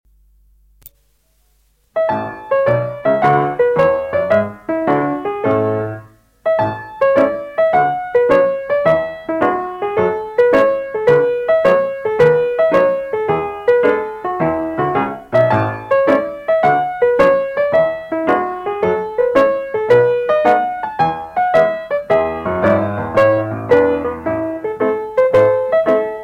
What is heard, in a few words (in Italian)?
danza classica, stacchi sul collo del piede; musica per danza classica e ginnastica artistica